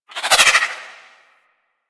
Dodge Roll Left, Vehicles; Movement; Warframe; Archwing

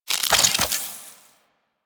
Warfan Equip; Infested; Warframe; Weapons; Melee

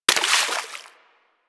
Bullet Impact Debris Water

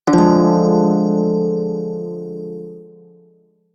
Prime Shawzin Min Chord B; Music; Warframe

